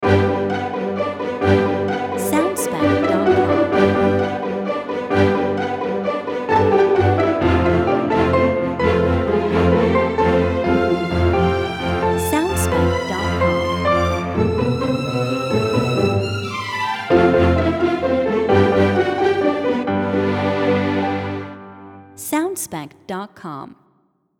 Funny Advertisement

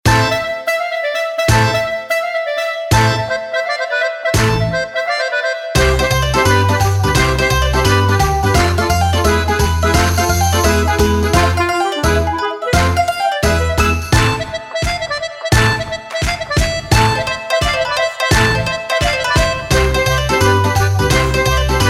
Tarantella Tavern Dance Shorter Version

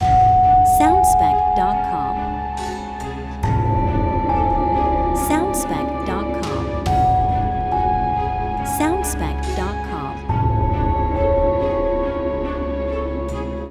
Dark Temptation Loop

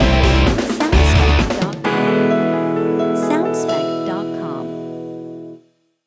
Good Vibe Pop Signature

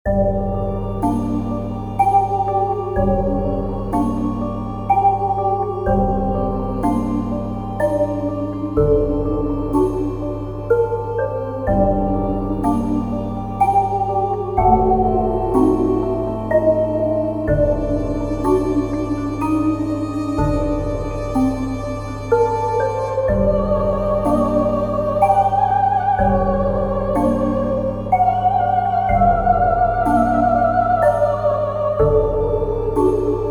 Spooky House Mystic Voice Melody

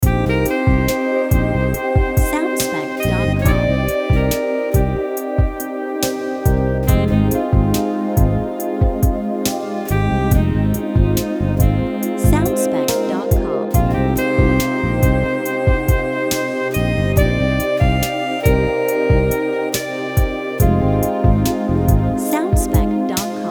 Sexy Lounge Sax Version

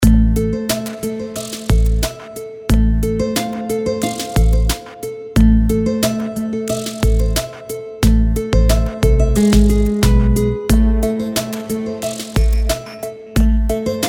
Mystical Triphop

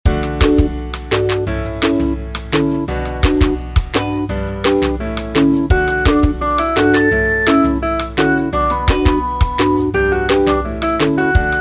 Happy UpBeat Short Version

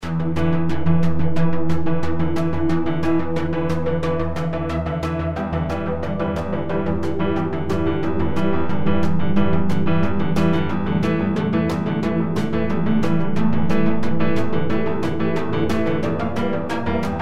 Urban Chase Loop

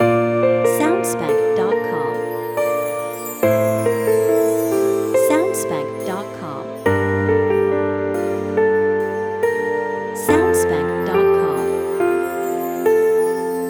Tender Piano Loop

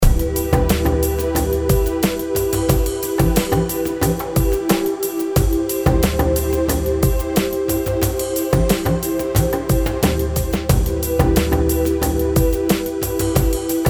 Mystic Lounge Short Loop

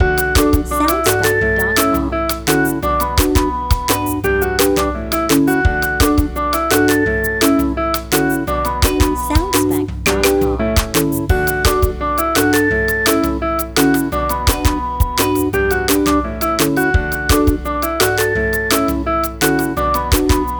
Happy UpBeat

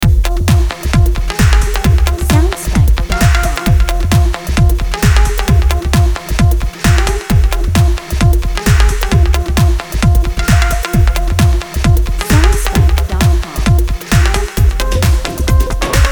House Club Party

